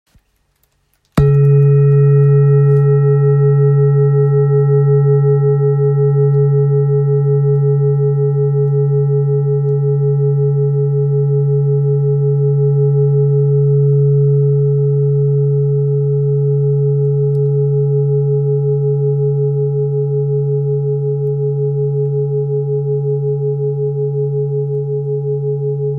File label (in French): Bol grave fleur vie do diese 2.18kg